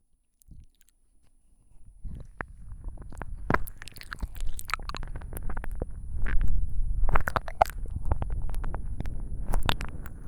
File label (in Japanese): いろんな耳舐めを両耳から感じる（両耳舐め）